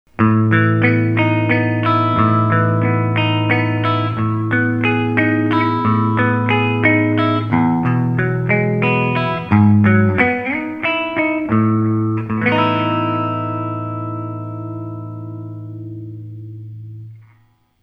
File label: Electric Attak